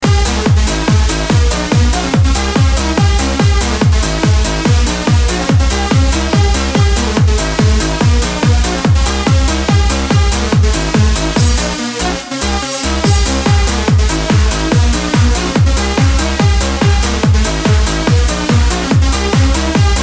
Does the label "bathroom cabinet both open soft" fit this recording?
No